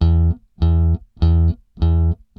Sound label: BASS, ROCK POP; MAGIX